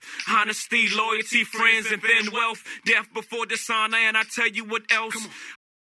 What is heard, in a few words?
HOOKS (89bpm)